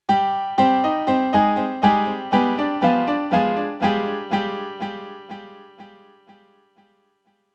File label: Piano Delay Hardware